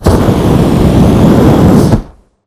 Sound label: amb fire blast; Titanfall 2